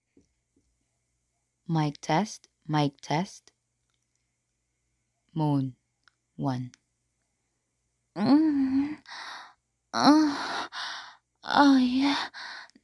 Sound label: Mic Test Moan